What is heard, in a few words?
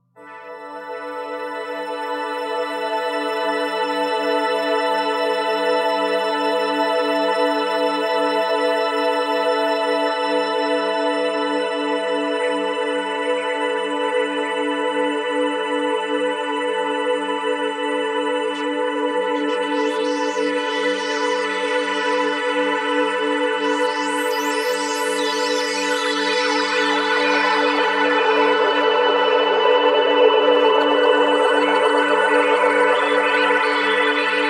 Kurzweil PC4 Pad